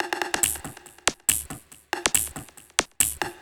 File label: Loops 140 BPM Hihat Percussion Loops